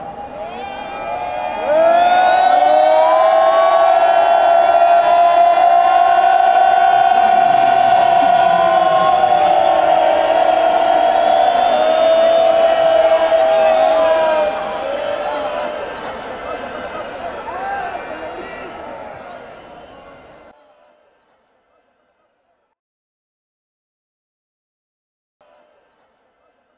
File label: eeeeeeeh, fan chants